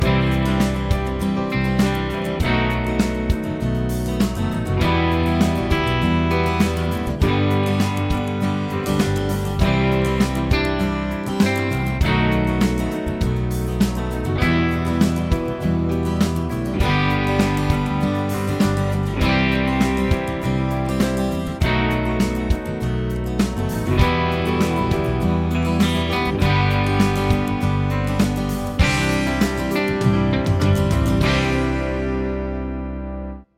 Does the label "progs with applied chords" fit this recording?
Yes